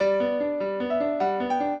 piano; Mozart

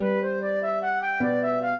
trio, flute harp; Mozart

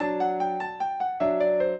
trio, piano; Mozart

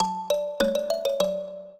minuet, mbira; Mozart

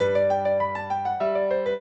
trio, piano; Mozart

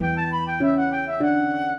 minuet, flute harp; Mozart

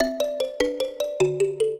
trio, mbira; Mozart

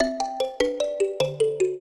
trio, mbira; Mozart

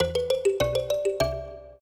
trio, mbira; Mozart

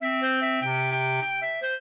clarinet; Mozart

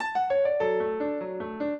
piano; Mozart; minuet

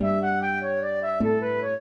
trio, flute harp; Mozart

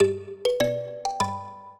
minuet, mbira; Mozart

